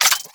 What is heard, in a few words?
Burst Gun Reload Mech; Warframe; Archwing